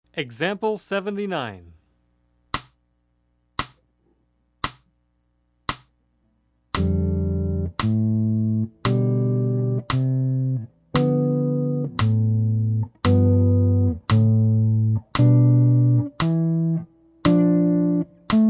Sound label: Jazz